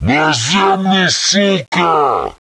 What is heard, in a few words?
attack, troll